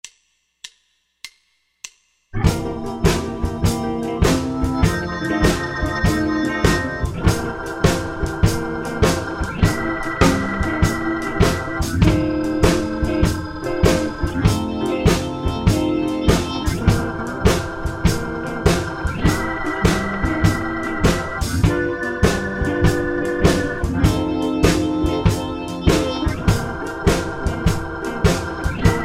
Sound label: Beginner Blues Quick Change C, bass